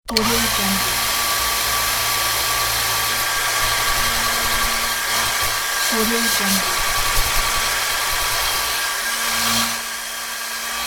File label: hairdryer